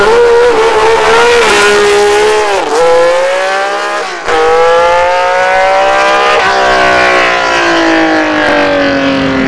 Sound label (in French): bruit moteur; Blender